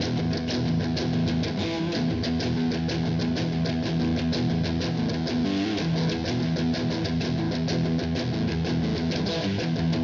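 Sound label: just guitar loop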